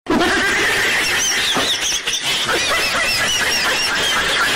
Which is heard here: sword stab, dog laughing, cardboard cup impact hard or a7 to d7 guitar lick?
dog laughing